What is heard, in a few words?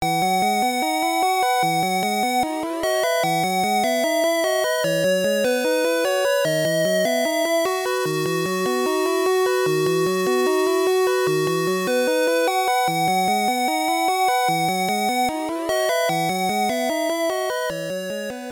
BGM 2 (level 1~4 ending), gb; quarth 1990